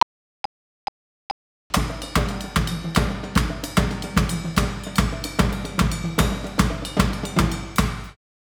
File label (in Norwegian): Trommesolo